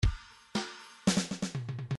midi file fills rock fill

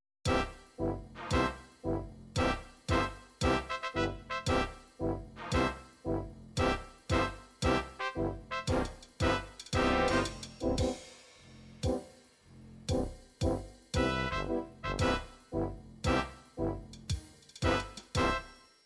psr9000 mar, midi

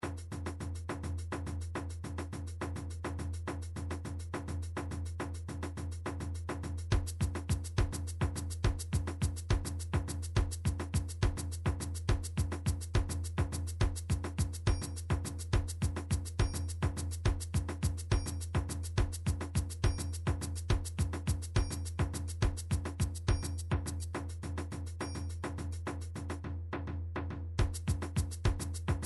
drums yanvalou

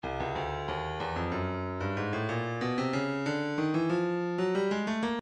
breakbeat 093 bpm; rbees a; Stylus Breakbeat Midi Files

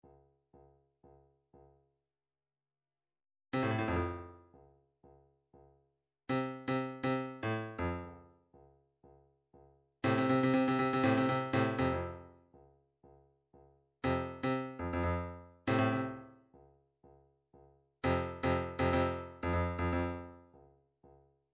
drum trax tom

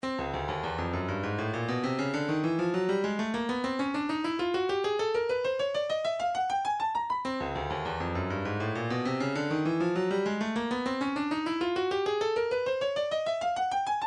wavedrum, midi

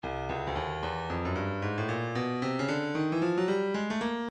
stylus breakbeat midi 113 bpm housejam

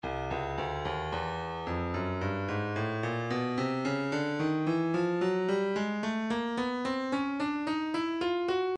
percussion midi ride cymbals 110 space ride; Stylus

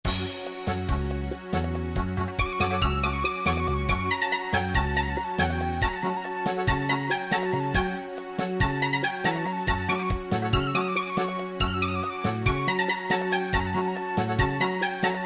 bulgarian kiochek

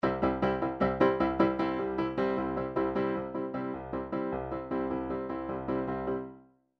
midi chord lines beat pop; Midi Files 1000

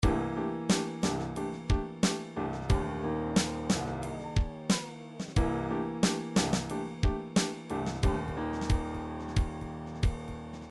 triphop; Newtronic Triphop Construction Kit

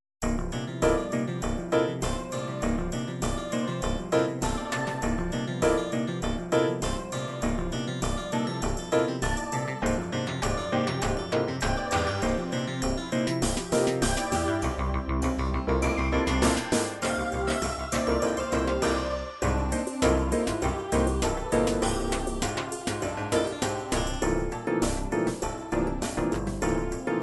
techno, midi

